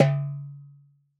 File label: Doumbak; World Percussion; Cymatics; Emperor World Percussion